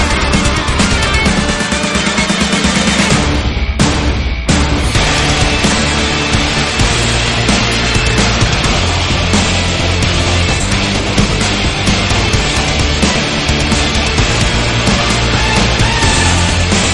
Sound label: instrumental; metal gear rising revengeance ost